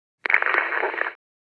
CB radio walkie talkie static burst squelch and noise